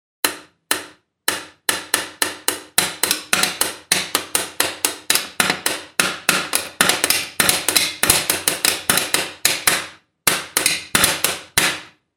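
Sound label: plastic sound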